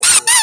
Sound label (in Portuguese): pato de borracha